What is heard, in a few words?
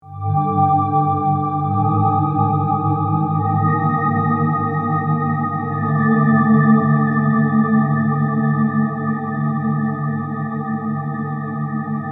Synth Swell Ghostly, Production, Element, Synth, Swell, Ghostly, Ethereal; Swells; BlastWave